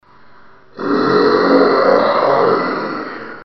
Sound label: zombie; dod